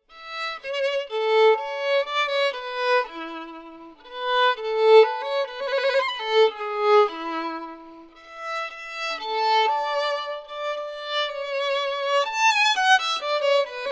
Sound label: Violin; Assassin's Creed Syndicate